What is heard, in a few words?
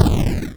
explode, 8-Bit Sounds